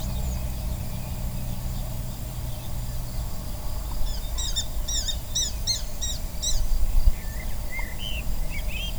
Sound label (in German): Baumfalke; Vögel